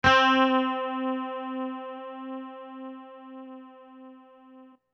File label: Chorus Plus